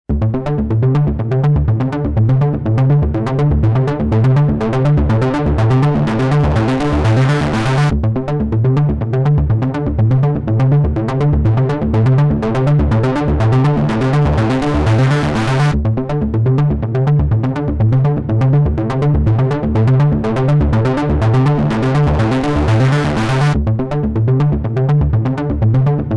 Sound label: Sequencer active